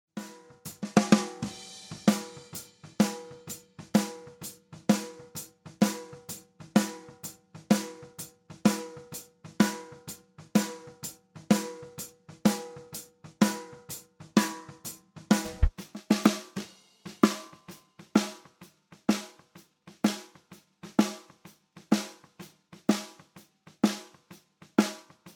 Snare solo, Snaredrum - Schlagfell, Reso, zusammen; erst das Mikro am Schlagfell, dann das Reso-Mic, dann beide zusammen (eines in der Phase gedreht)

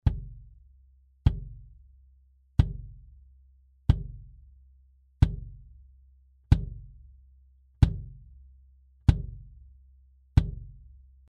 re 20 innen

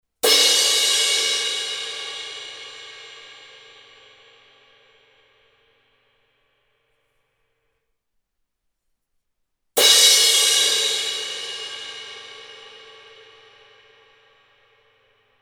Mellow Crash vorher, beckenreparatur; beckenreparatur klangvergleich vorher nachher

